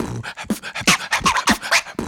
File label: 120 Puppy Ratchet, World Dance; Spectrasonics - Supreme Beats